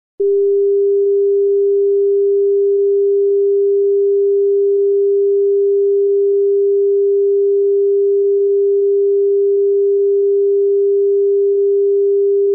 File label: Radio Tone - 400Hz